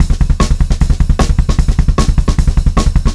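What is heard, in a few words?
152 speed 2 variation, pop rock drum loops; USB Soundscan vol.37; AKAI; 90 s Sample CDs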